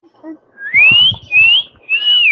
assobiando

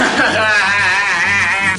risada

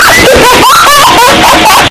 risadada

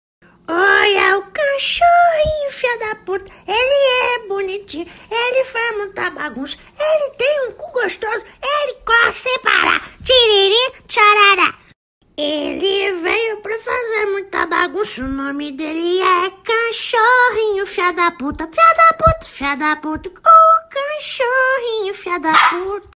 cachorrinho

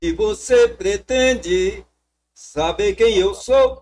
cantando

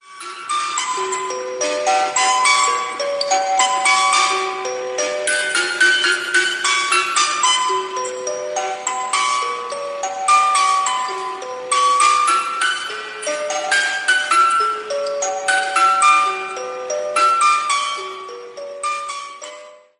caixinha do suspense

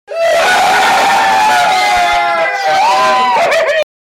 gritaria; relo pipa combate

